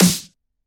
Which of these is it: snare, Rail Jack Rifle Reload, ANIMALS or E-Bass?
snare